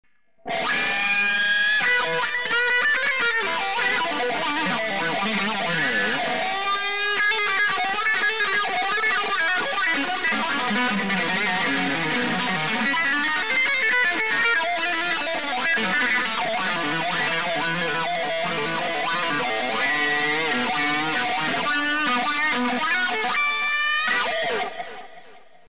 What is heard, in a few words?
wah; gs10